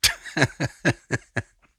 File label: male chuckle